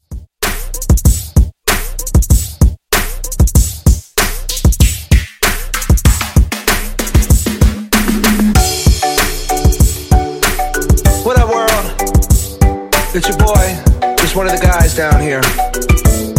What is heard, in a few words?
redrum 98